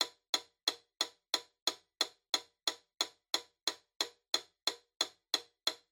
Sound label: Metronome Click Loop Fast